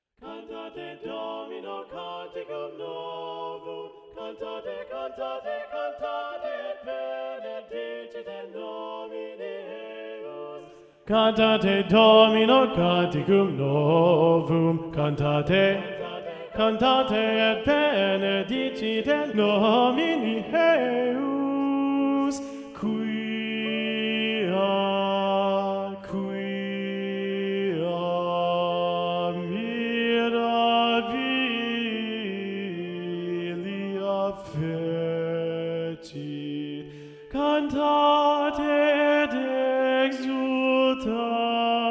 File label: Cantate Domino Bass 1 Predominant; Claudio Monteverdi